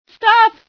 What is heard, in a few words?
scream, female sci